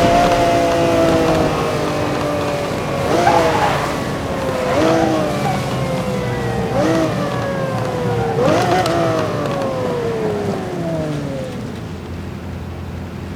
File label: throttle off, vehicles; aventador